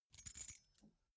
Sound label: key ring small rattle air soft